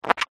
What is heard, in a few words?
cartoon rubber latex pants squeak; zapsplat